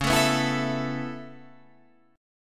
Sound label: B 7th Suspended 2nd Suspended 4th, chord